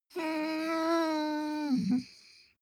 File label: dog whine low sigh; natur-tiere; hunde